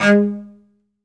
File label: SOLO CE, Strings; ILIO